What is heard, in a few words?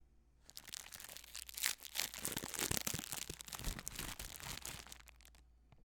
Packet Opening